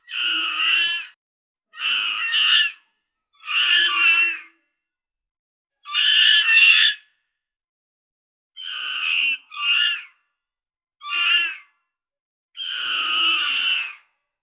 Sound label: angry hawk cry loudly